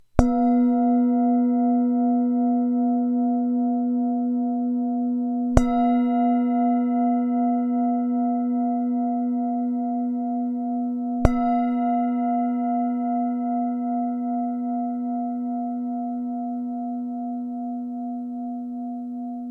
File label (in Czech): tibetska misa; b3; 17-5cm